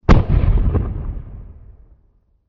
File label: explosion low dist; amb elements; modern warfare 2